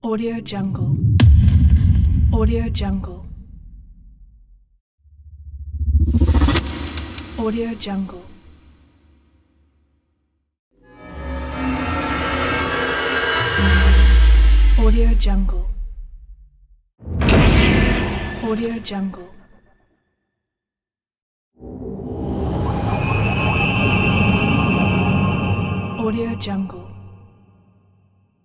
epic hybrid hit cinematic pack

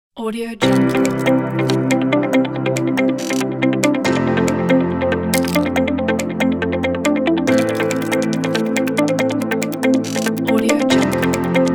the technology corporate

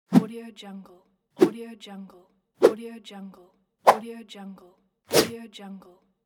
woosh

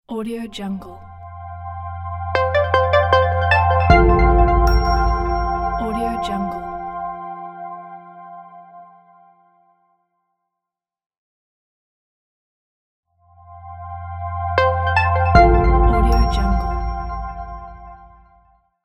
minimal clean logo

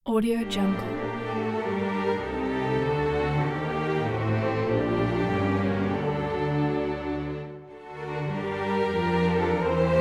bach chorale for orchestra

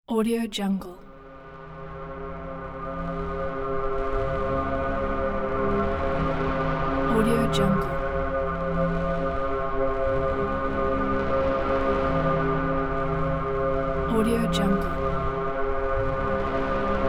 dark meditational background; cinematic-designs

